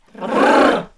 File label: NOISE, CLASSIC VOX; Best Service; Hallelujah